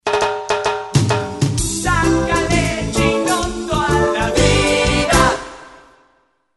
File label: REGGAE